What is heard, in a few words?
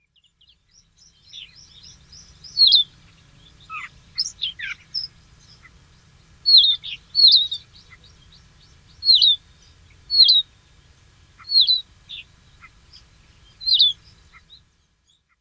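Siberian Rubythroat; yonaguni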